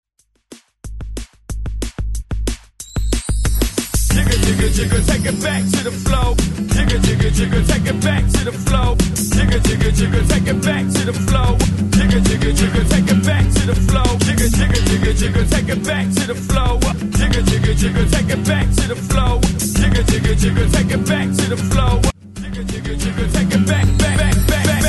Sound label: 60S Redrum, 93 bpm